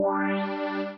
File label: string